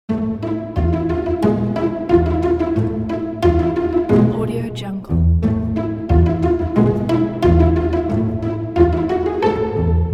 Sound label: dancing in autum pizz